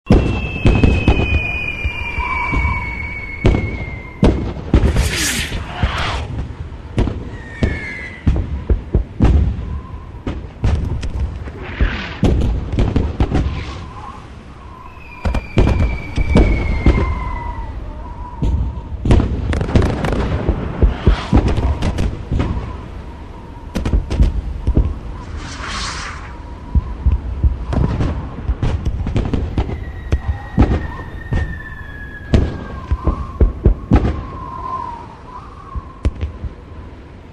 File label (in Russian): zvuki voyny zvuk boya